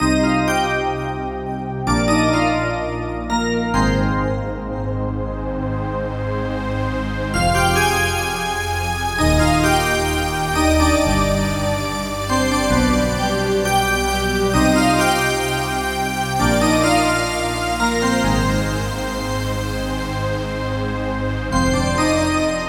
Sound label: x-mas orgpad